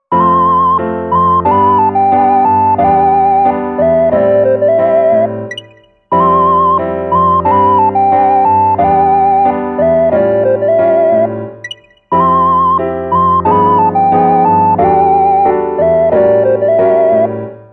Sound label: Chord 7